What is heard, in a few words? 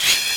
Tile Match Applause; SNES; Shanghai 2 - Dragon's Eye